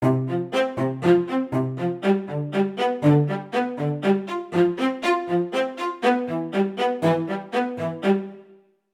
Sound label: strings rs135 8khz 8db; softube abbey road studios brilliance pack